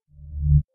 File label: session inactive, homepod